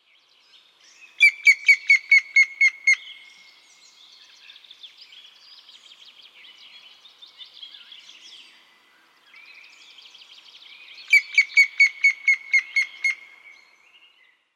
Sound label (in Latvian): piccan balss; a.celmins